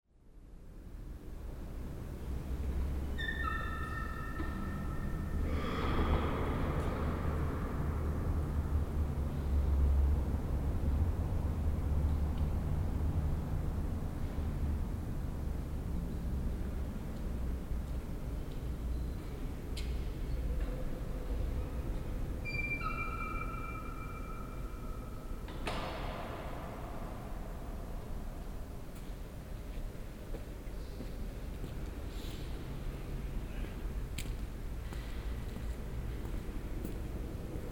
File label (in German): gdansk kirche